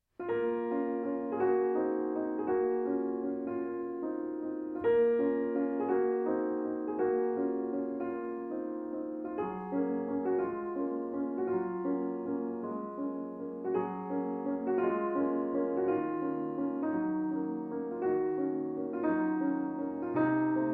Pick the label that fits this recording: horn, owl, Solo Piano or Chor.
Solo Piano